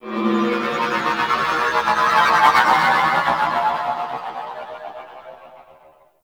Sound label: Ship Exterior Space Drones; Warframe; Corpus Ship Remaster